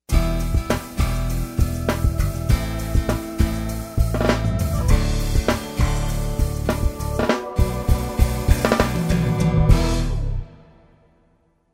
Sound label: Rotary